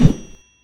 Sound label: miner shoot; Risk of Rain